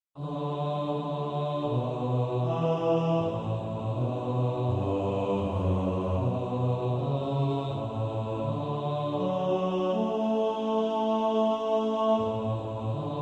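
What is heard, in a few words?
bass, practice music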